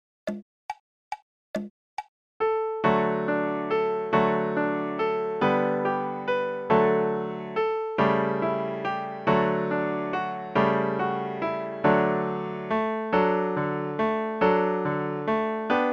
Good Morning In D

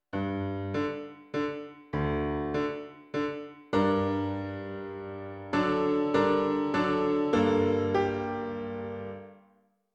Piano

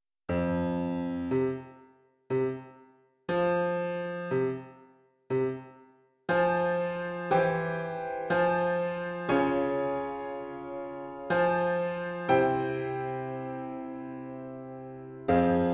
Piano

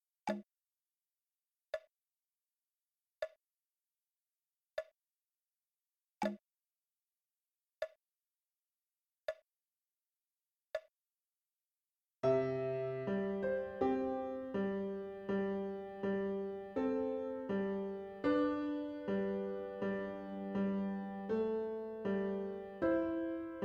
Raindrop Prelude Slo

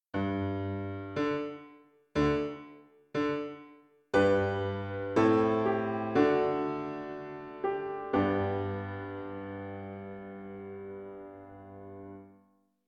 Piano

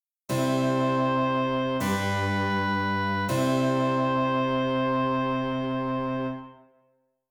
continuo, dictation

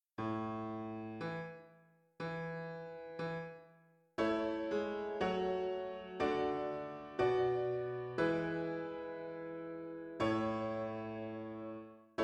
Piano

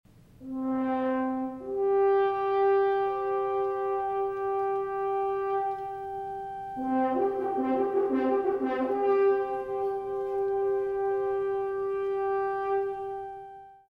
Shofar

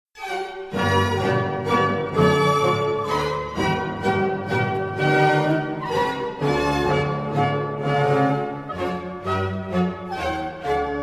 minuet

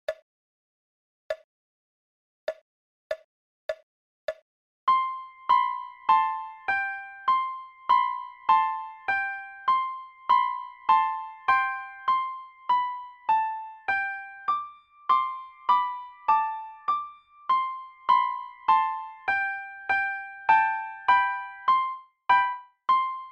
Jingle Bells Duet

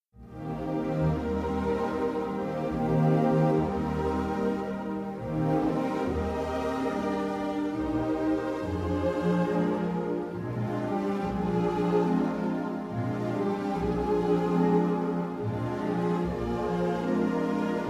Lullaby

